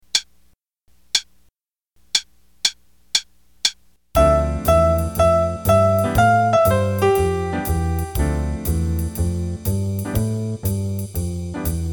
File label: Play a long Trading with Jazz Rhythms Dmin 32; D min7 (D Dorian) (32 bars); Trading with Jazz Rhythms, Set 5; Jazz Improv Play-a-Long